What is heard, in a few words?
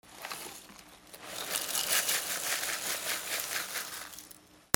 KITCHEN